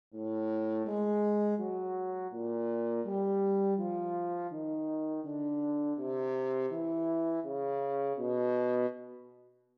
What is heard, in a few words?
melodic error detection; ear training